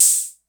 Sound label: HH Open, Vermona DRM1-MK2 Hi Hat